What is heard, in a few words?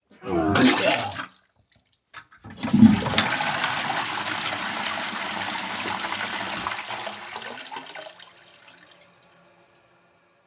vomit and toilet flush; human